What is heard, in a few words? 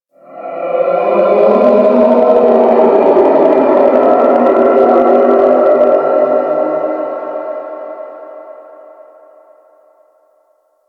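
Distant Moan One Off, Shipyards; Warframe; Grineer Shipyards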